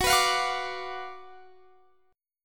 F sharp Major 7th Suspended 4th Sharp 5th, F sharp, down, Guitar, Standard; F#M7sus4#5 strummed